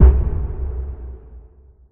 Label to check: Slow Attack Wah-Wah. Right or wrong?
Wrong